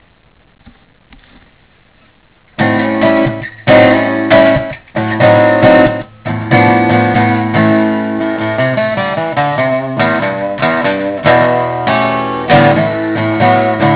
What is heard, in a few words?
blues